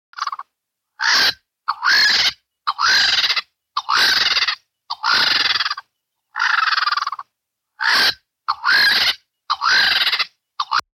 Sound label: white backed vulture call